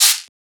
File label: Shaker; FLPRO